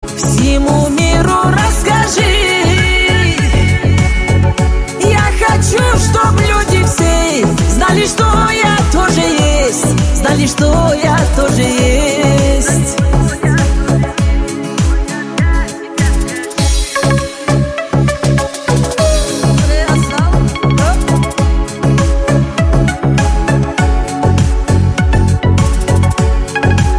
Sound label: remix, islamic